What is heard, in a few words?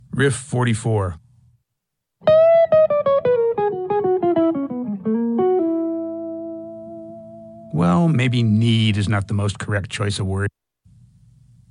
Riff